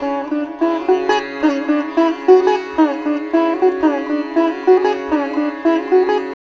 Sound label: SITAR; Spectrasonics; Heart of Asia